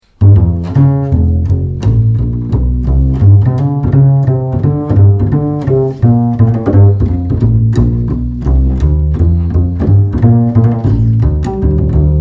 antique double bass sound sample, blonde double bass